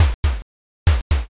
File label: heartbeat tense